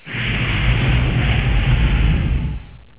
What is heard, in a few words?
blizzard